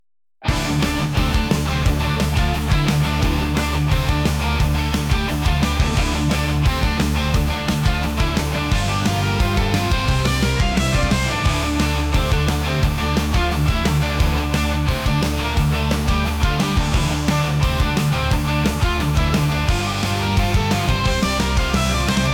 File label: Japanese Anime Rock